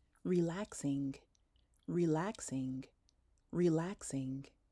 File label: relaxing